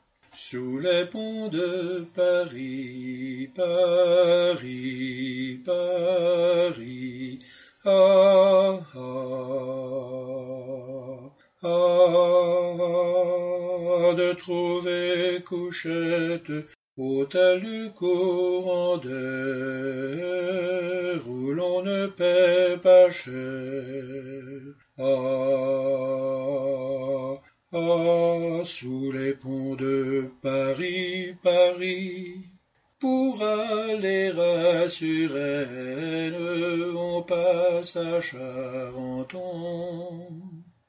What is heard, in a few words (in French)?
basse; sous ponts paris